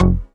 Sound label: Synth Bass; Vengeance Samples; VEE Synth Shots